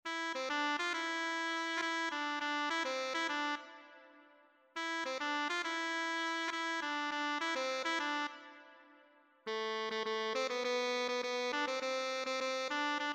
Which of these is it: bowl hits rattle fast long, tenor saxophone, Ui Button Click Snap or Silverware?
tenor saxophone